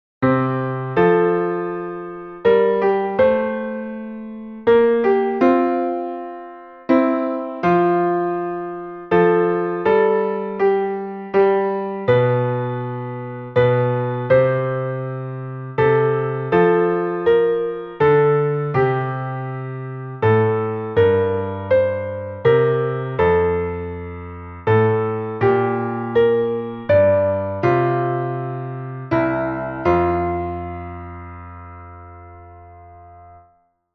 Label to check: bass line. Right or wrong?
Right